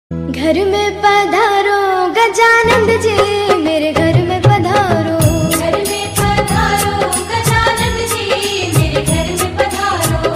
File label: ganesh ji tone; Navratri Special